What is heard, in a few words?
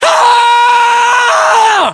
melee charge; english; voiceovers